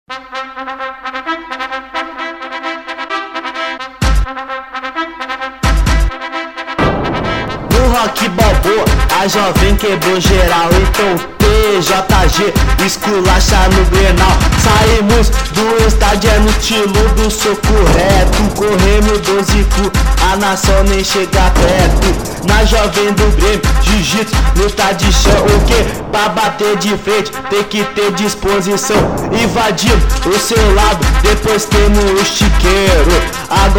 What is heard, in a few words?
rock